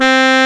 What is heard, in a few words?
brass, C3